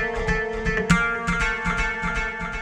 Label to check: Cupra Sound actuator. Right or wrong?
Wrong